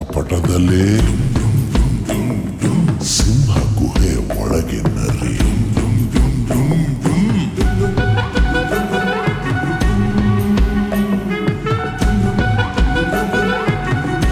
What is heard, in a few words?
Ringtone Slowed Reverb; Kannada; Max